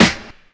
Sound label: snare sound a sharp key